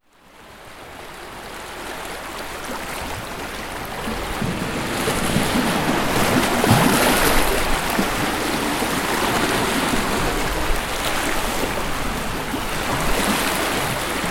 swirling waves on rocky coast

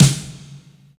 ambient snare drum sample c sharp key

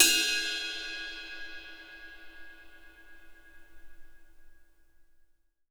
ride cymbal sound c key

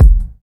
rich low end kick drum one shot e key

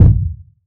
wet kick drum single shot b key

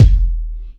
low tone bass drum single hit e key; kick drum samples

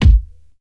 fluffy kick drum sound g sharp key

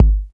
clear kick sound g sharp key

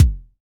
natural kick sample a sharp key; kick drum samples

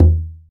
deep tom drum sound e key; tom drum samples

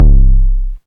warm kick single hit C key; single hits one shots

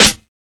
00s crispy snare drum sound a key

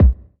smooth kick drum single hit b key

